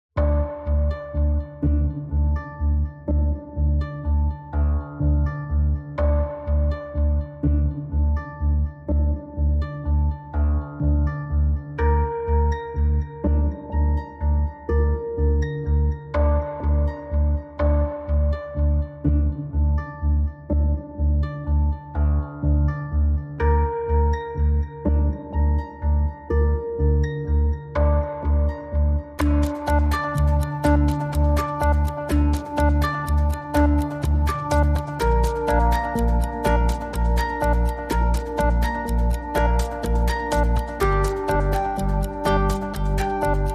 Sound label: Pulsing Tick Tock